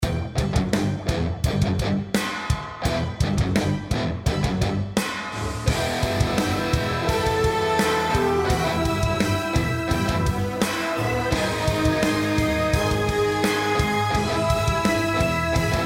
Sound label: general Music layer; Champions of Valhalla